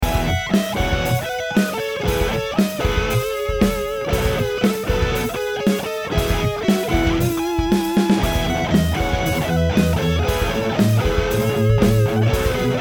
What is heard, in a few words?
Od 4x12 Vintage 30